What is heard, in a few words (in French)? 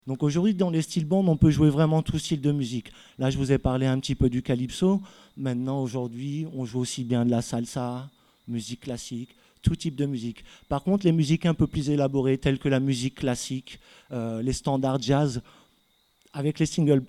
Demonstration musicale musique classique, steeldrum; Trinidad et Tobago